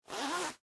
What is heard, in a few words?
Dress Pants Zipper, Up; Foley, Clothes, Dress, Pants, Zipper, Down; BlastWave